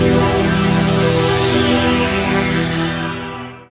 SYN DANCE; Sound & Vision - Gigapack II

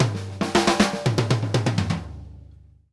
Drumworks SP fills 120; Sampleheads; New York City Drumworks VOL-1

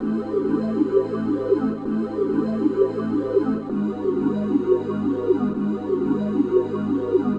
Chd Fx Loop 130; Equipped Music; Loungin House

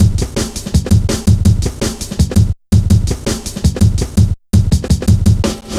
Hard Edged, Drum Loops, 165.5; Zero-G Jungle Warfare 1, 2 & 3

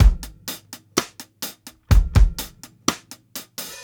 125 HALF TIME, DRUMS LOOPS; BASEMENT DRUMS VOL 2

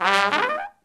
SECT BRASS FX

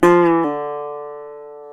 GTR, Dobros, National; Club-50 - Foundations Roland

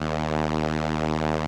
SOLINA, String Machines; Best Service; XX Large Most Wanted 2

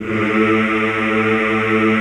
A1, Classical Choir; Best Service; Extended Classical Choir

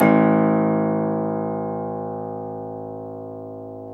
PNO, Tack Piano; Club-50 - Foundations Roland

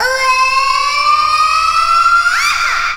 vox special

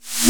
909 snare; 300 Drum Machines

